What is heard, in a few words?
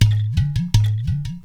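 percussion udu; Roland LCDP14 Africa VOL-2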